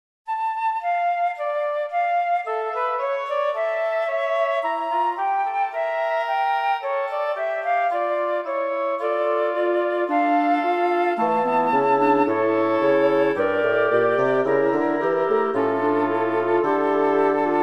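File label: D minor counterpoint woods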